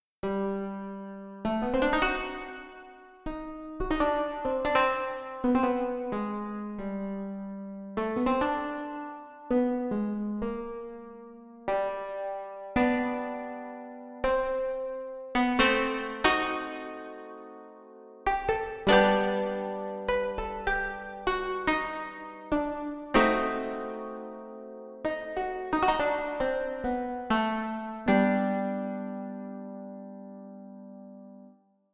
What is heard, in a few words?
plucked